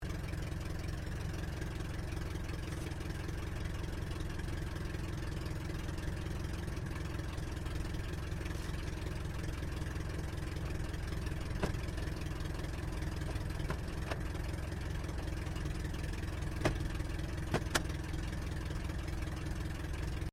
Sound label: Aircraft Dehavilland Tigermoth Idle Interior, RAAF Tigermoth biplane training aircraft